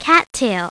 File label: cat tail; My Science Fair